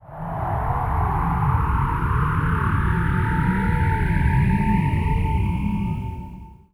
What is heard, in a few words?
VFX Atmos